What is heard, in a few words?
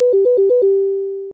Controller Mouse Test Unused Jingle; SNES Burn-in Test Cart